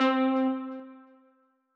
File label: Processed Lead